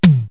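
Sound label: tom, drum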